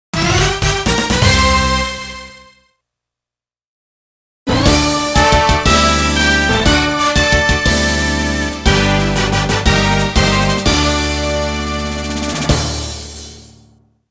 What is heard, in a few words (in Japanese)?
一章クリアジングル; power pro kun pocket 12; 裏サクセス